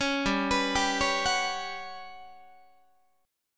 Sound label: G Major 7th Flat 5th, Guitar; GM7b5; arpeggiated